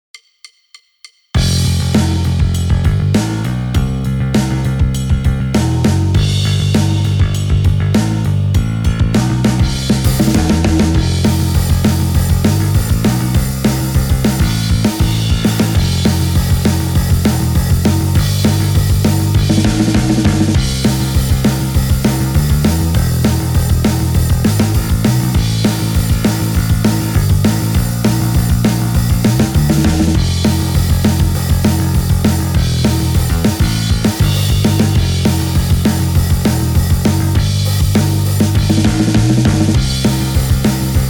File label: kick highpass